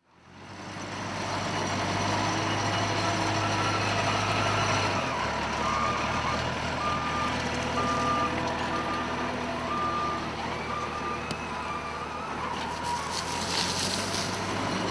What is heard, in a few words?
jcb with pusher on front reverses then lines up to push sugar beet up into nice heap